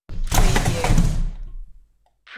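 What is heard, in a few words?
Dresser Drawer Open, Dresser Open and Close; Sounds in the home of drawers being open or shut.; household sounds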